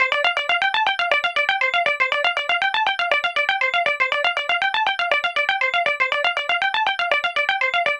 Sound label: Break Drop Arp 120 Cm Dry